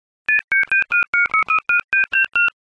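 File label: iphone keyboard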